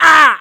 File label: Tech Damage